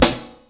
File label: SP1200 snare; E-MU Formula 4000 Series Vol. 1; Hip Hop Nation